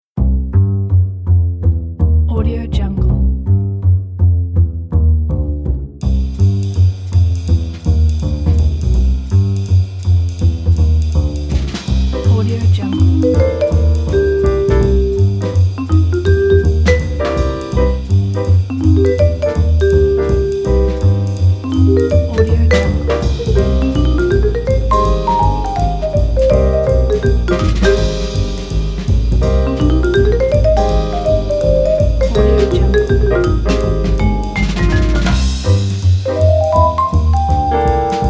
fast bebop jazz film noir; dabbling-duck-productions

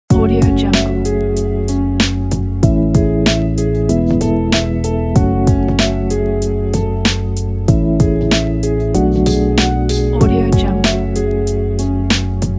hiphop loops pack

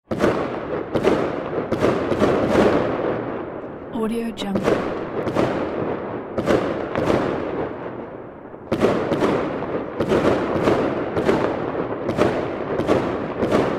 firecracker explosions

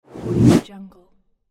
air swipe

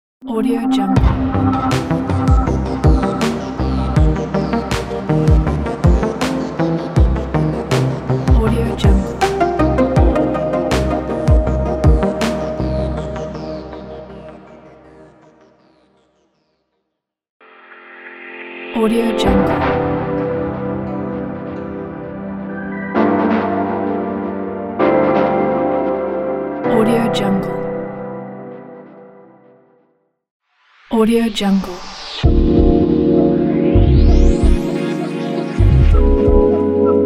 bright summer logo pack

